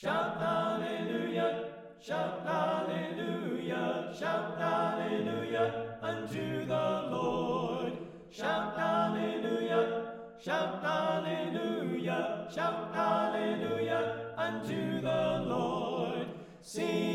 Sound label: Alto Left